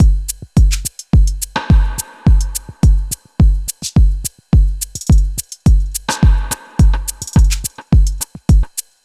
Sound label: 106 drum loops; ShakaDubba; M8; Electronisounds